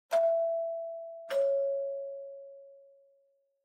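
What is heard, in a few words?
Doorbell Mechanical Tone Far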